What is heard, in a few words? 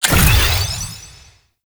Multinade Boost Pad Throw; Warframe; Trapper